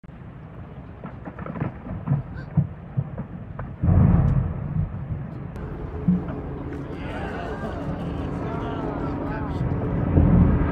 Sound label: Polar ice, یخ های قطبی